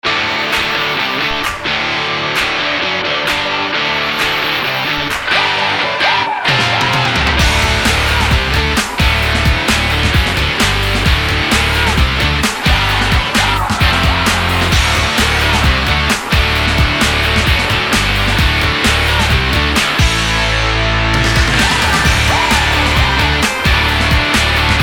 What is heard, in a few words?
Rock